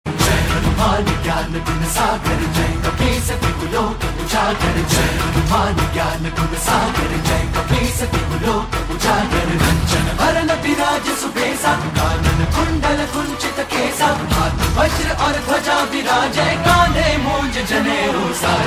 Remix Ringtone; Hanuman Chalisa

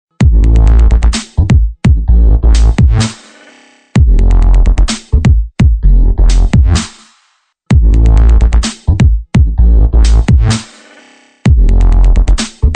Digital Techno Ringtone

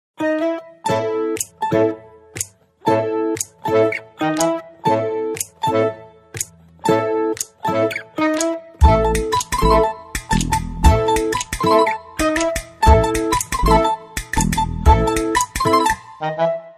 BGM Telugu Ringtone

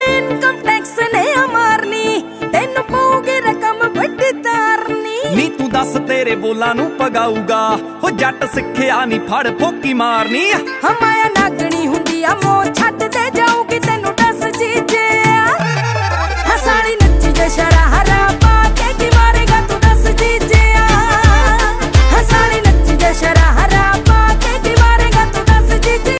Dance Punjabi Ringtone

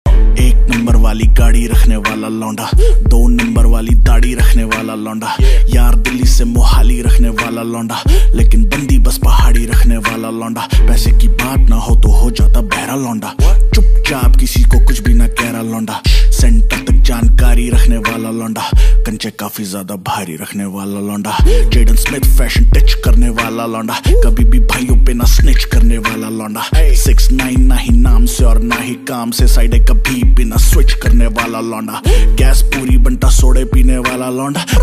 Dance Punjabi Ringtone

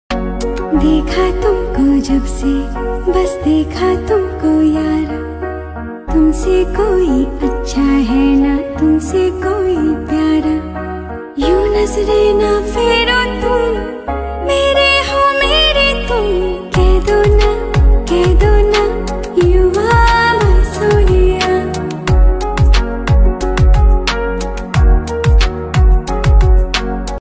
Female Ringtone

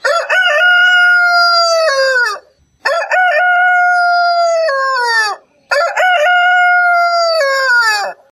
Morning Rooster Ringtone

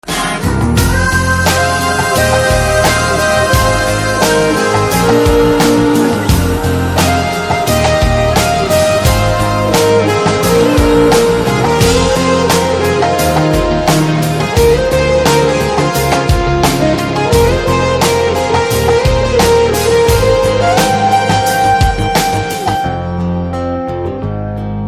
BGM Telugu Ringtone

